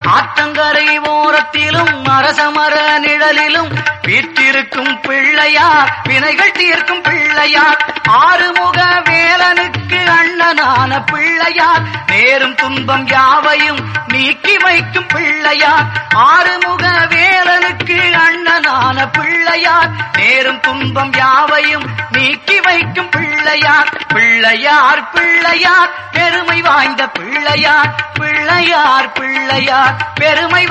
vinayagar song

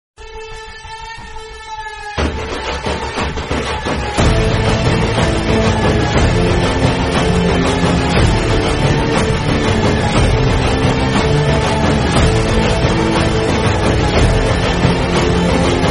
climax bgm; kgf 2

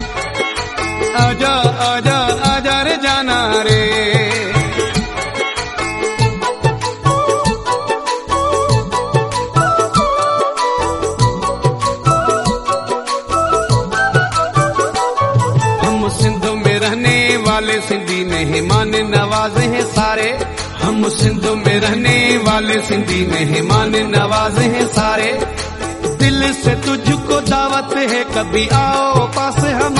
sindhi urdu song

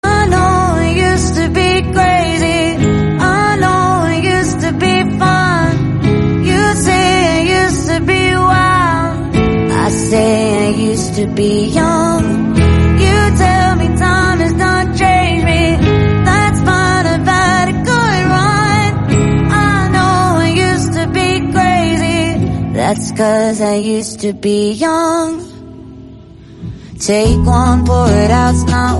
english song

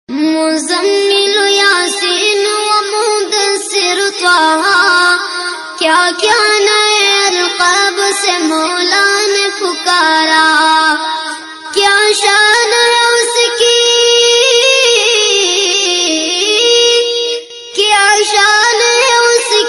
naat sharif islamic

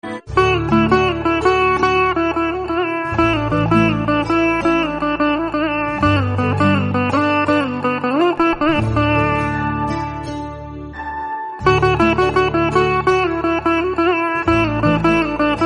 instrumental song

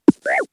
hit; runescape

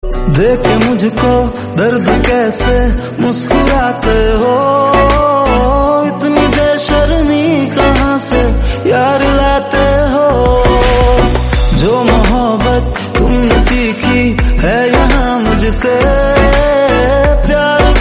sad hindi

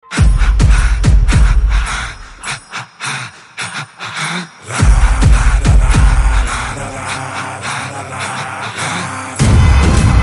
background music

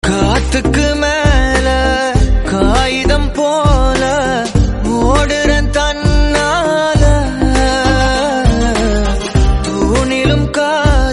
tamil love song

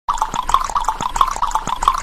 clucking; knuckles

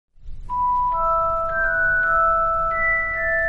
sound notification; harry potter

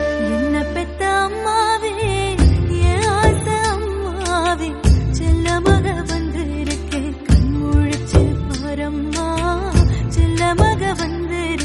tamil song

